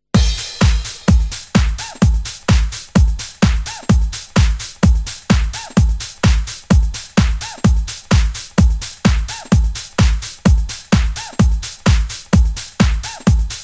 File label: Jingle bells (new voc radio mix)